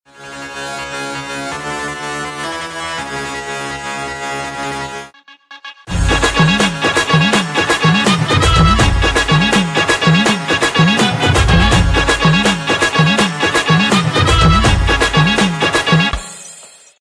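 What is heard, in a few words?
Dance background music ringtone; Chennai Express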